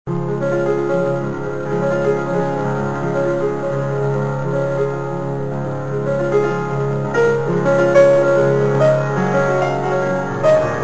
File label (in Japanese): tameiki, piano